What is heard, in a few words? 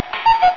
COOKOO